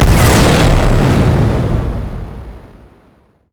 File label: recall leadout; LoL; velkoz skin01